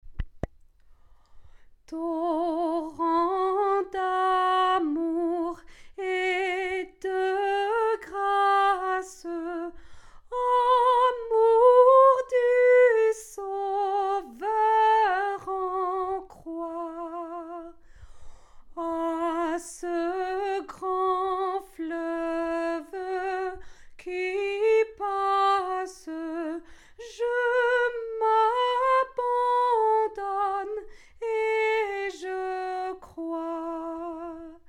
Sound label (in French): SOPRANO, atelier de chant a plusieurs voix